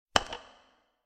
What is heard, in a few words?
Button Sound